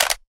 smg clip out; weapons

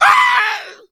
death, voice; hunter

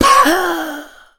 smoker death; voice

